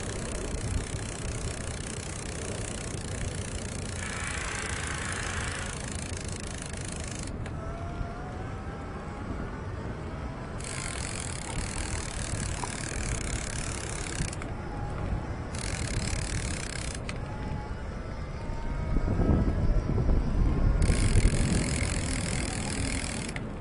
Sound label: Electric Bike Engine